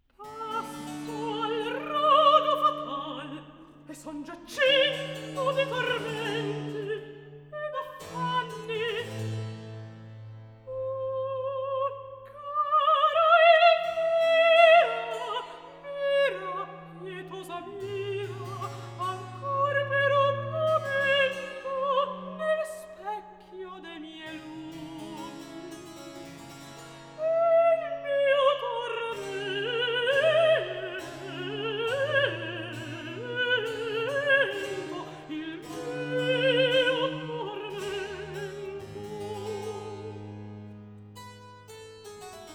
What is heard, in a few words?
Cantata, Recitativo; RV 654 'Elvira, anima mia'; 'Passo al rogo fatal e son gia cinto'; Antonio Vivaldi; Concerti e Cantate da Camera (2002)